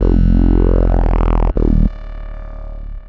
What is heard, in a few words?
Bass, Futurist Drum & Bass